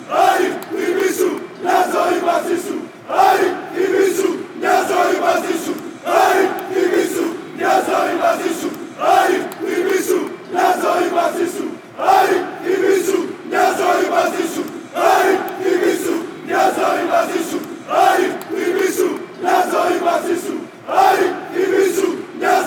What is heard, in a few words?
chant, Greece